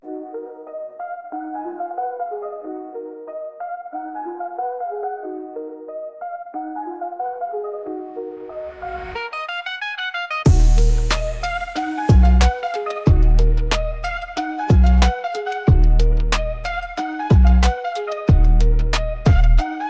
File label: GOSPEL LIGHT TRAP BEAT; Prod By XL LO SE BEAT